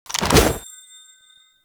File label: Grenade Throw; Warframe; The New War; Kahl; Abilities